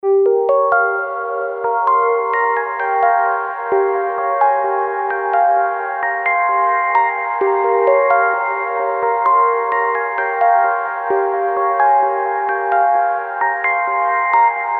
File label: Contemplative Synth; Apple GarageBand 2; Apple Loops